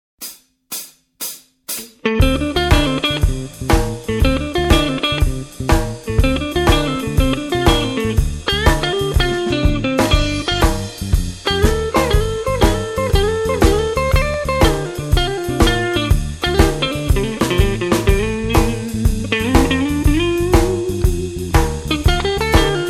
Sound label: blues jazz